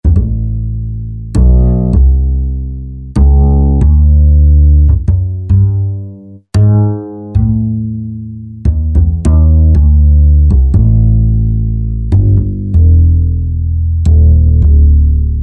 Upright Jazz Bass; Apple GarageBand 2

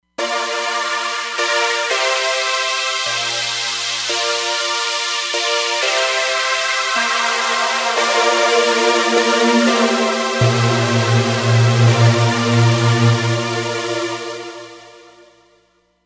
X Sweep Saws; Roland Fantom XR

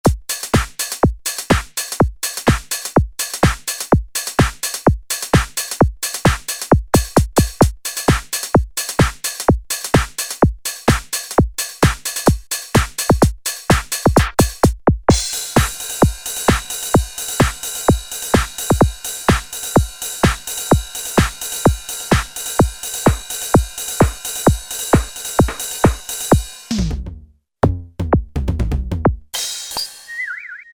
Club Kit, Alesis Z2 EuroDance

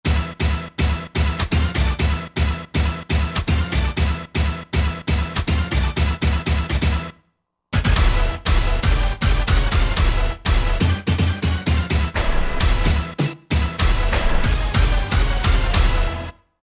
Tekno Hit, Roland JV-2080

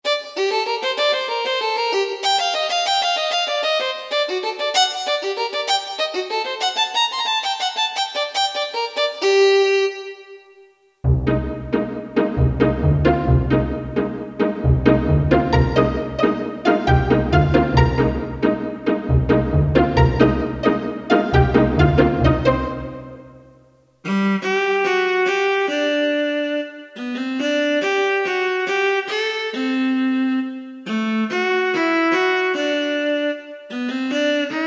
Orchestra Ethnic

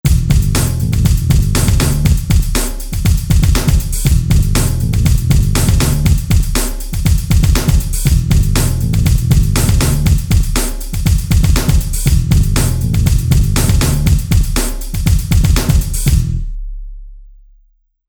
Rap Hip Hop

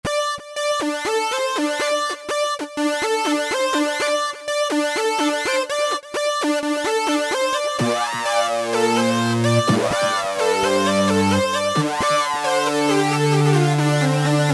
Lead, Korg MS2000; Factory Patches

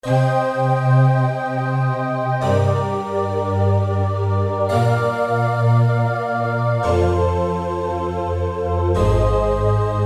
Soft Voices; Digital Synthesizer

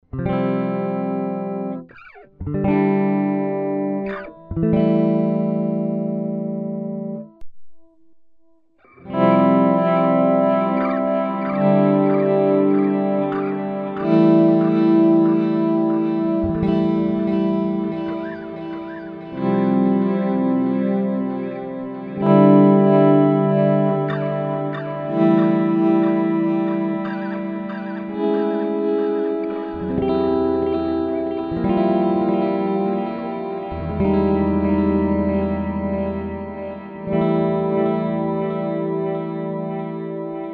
Earthquaker Devices Avalanche Run

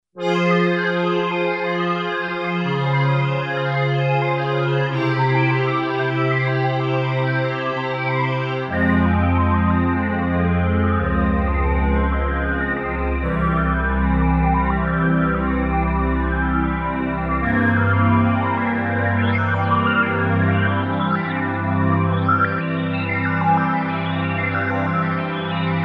Stereo Wave Sweep

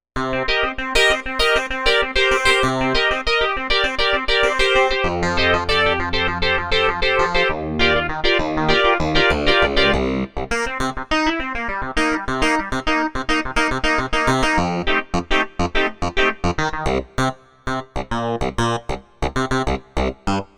Synth Clav

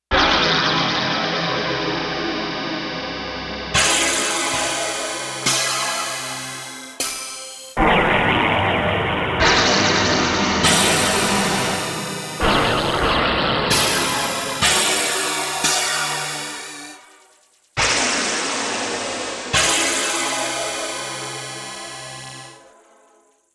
ShatteredHit; Digital Synthesizer

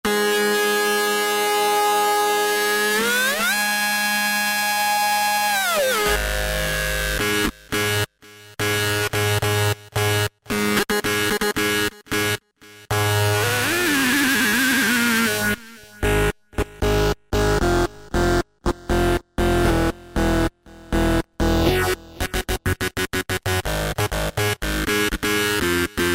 Feel no pain, Roland V-Synth

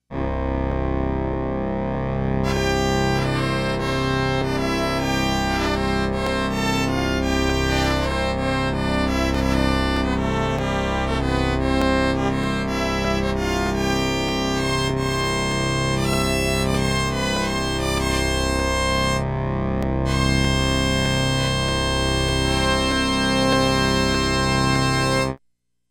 Bag Pipes

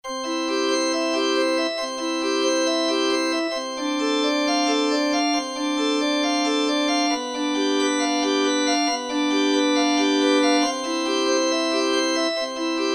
Korg MSC-9S Organ

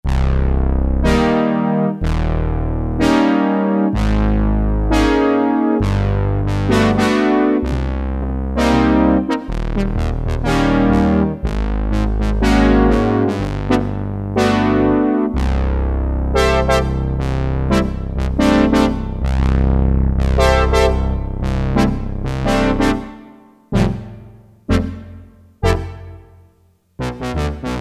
LO BRASS, Roland Jupiter-8; Factory patches

